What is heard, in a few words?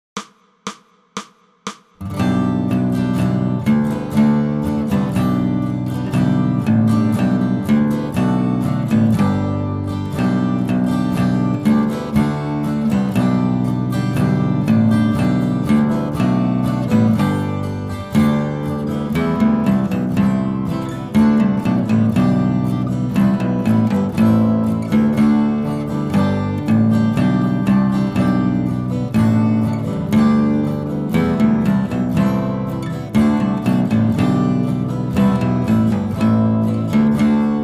Bass Solo; christmas; Angels We Have Heard on High